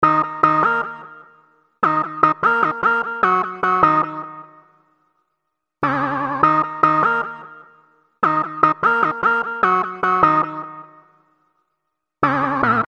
Jelly Synth; Industrial

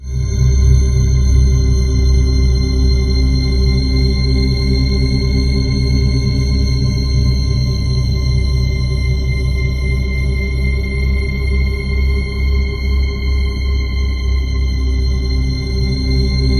BIG SYNTH, Ominous Drones; SYNTH SND; USB Soundscan vol.48; AKAI

